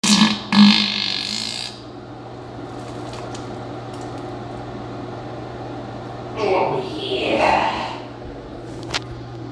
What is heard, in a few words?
sweet music